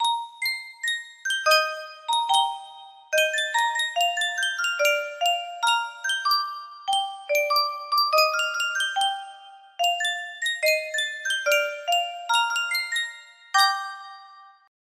Sankyo Music Box, music box melody

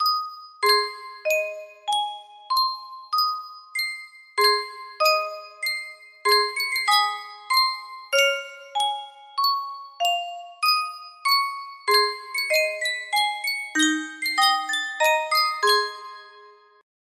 sankyo custom tune music box, music box melody; la traviata

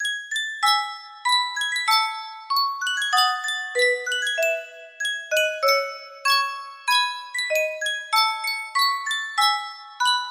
sankyo music box unknown tune

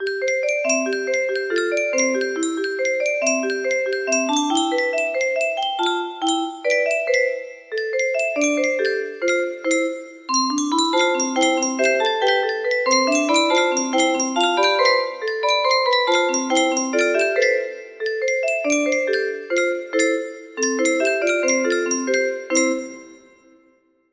hymn, music box melody

